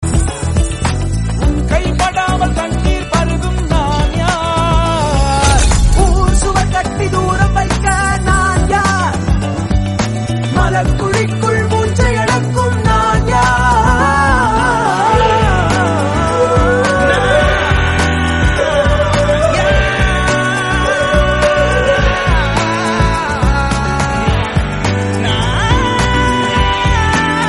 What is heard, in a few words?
Tamil Song